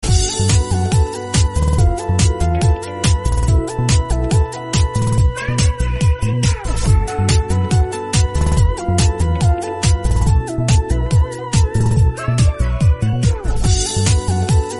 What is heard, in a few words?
background music